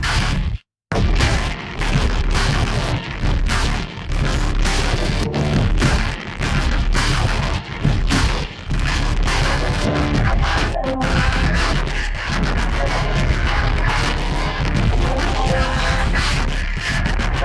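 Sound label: gabber with a distorted 303 and female vocal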